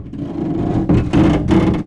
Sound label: car trap rope, ambient materials